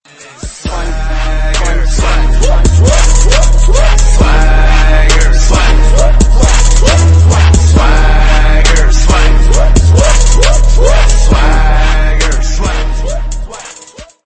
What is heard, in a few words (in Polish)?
swag dzwonek